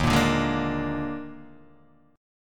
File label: Eb Major 7th Suspended 4th Eb, Guitar, Open D